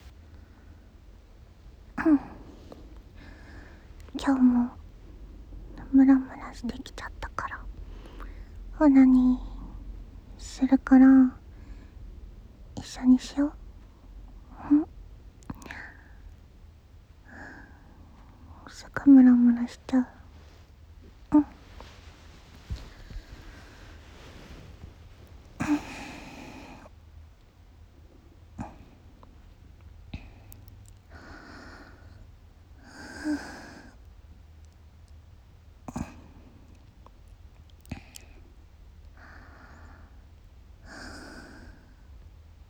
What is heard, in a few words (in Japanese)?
現役JD おなにー, 演技なしオナニー